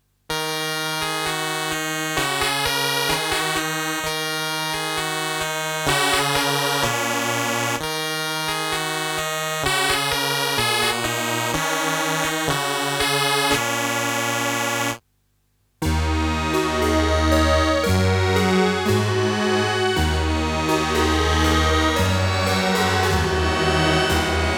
Velocity Sounds

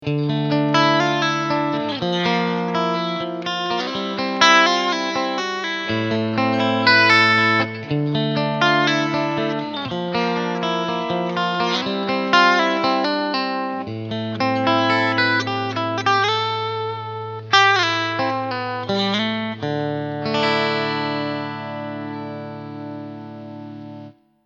Fender Strat

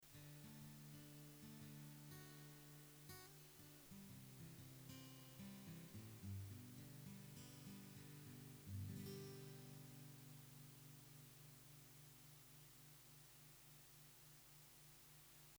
GAPNeve Phase Invert AGit Picking Beyer201

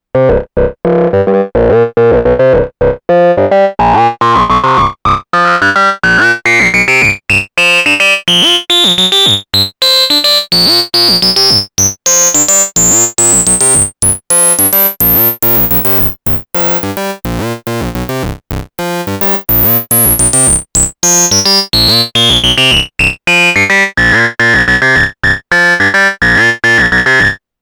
Korg Volca Bass Cutoff EG INT

